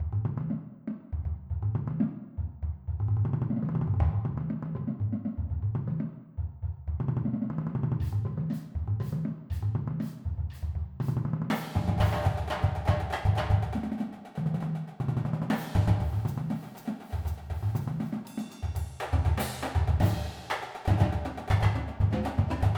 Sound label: Beat cymbals; headphones